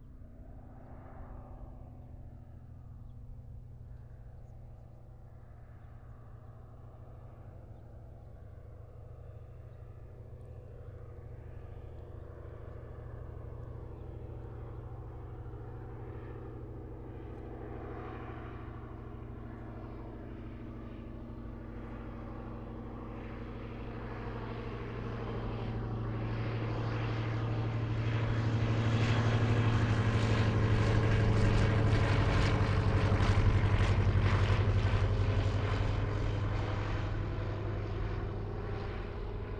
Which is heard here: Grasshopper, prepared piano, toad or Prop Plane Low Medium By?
Prop Plane Low Medium By